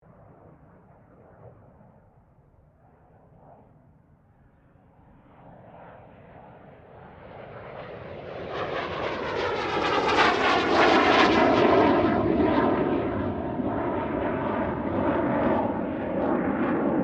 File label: AIRCRAFT CESSNA A-37B DRAGONFLY PASS, Jet Fighter /Bomber; Airplanes; Vehicles